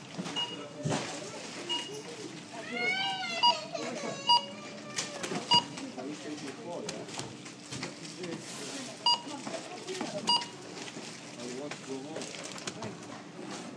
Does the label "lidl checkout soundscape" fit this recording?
Yes